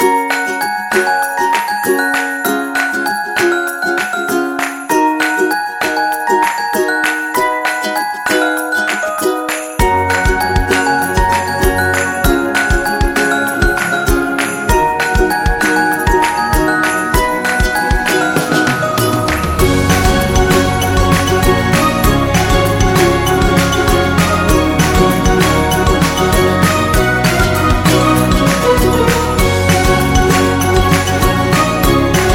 Winter Upbeat, christmas

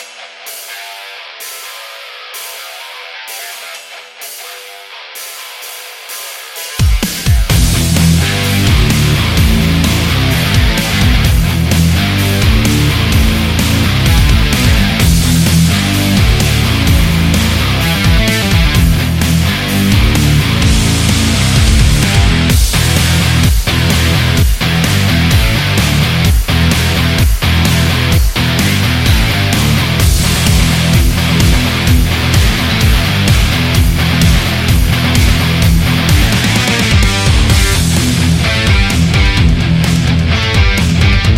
Hard Rock Power

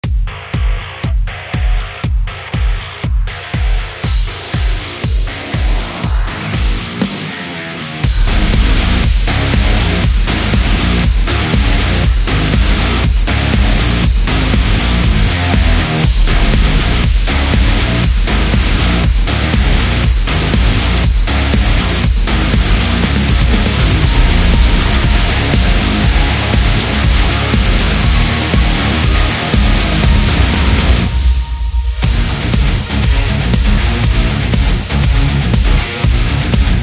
Metal

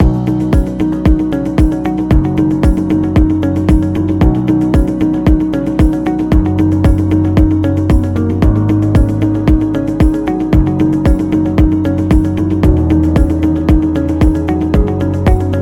Corporate Loop

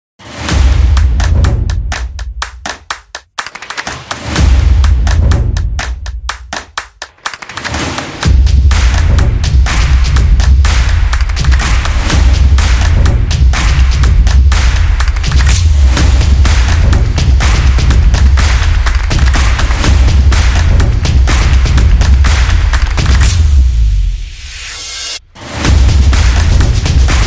Stomp It, percussive

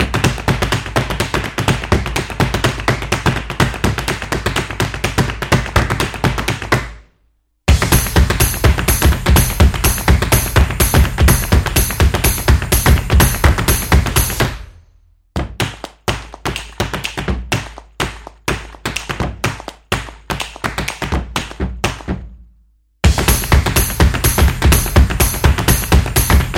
Energetic Drums Percussion

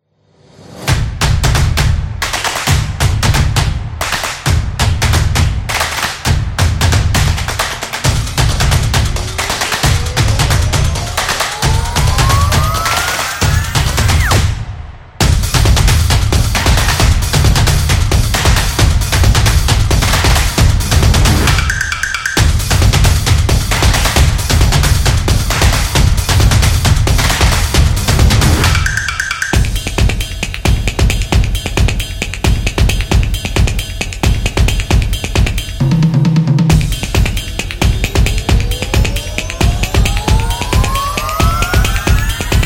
Upbeat Drum, stomp

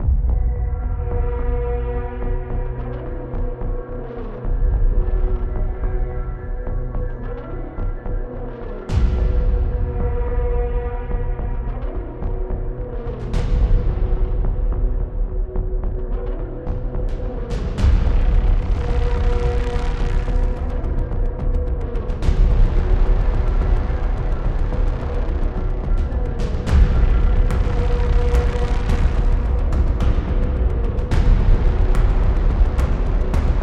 A Suspense

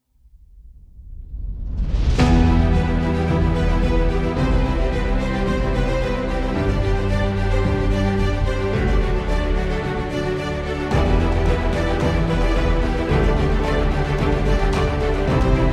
Epic Cinematic; epic trailer

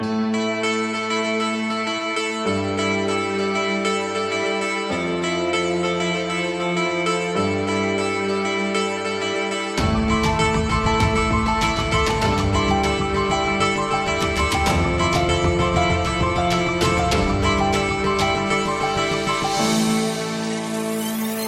Medical Corporate, corporate music; corporate music vol 15

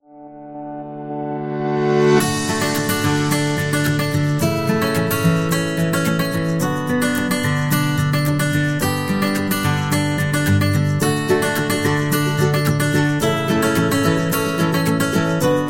Upbeat Acoustic Guitar; happy music vol 5

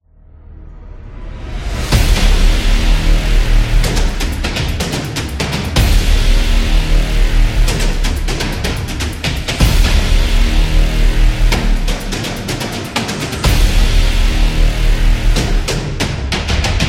Epic Drum

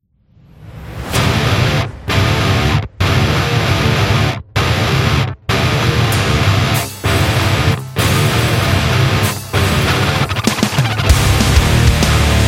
Rock Agression, metal

